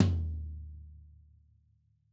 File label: Floor Tom